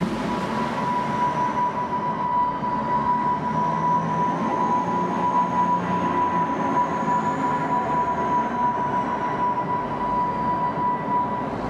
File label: Ambience; Grimrush